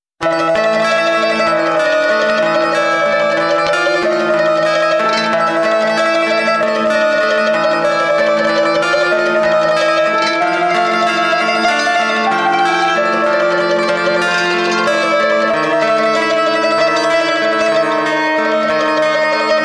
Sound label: Silent Hill Instr